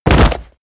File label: bodydrop leather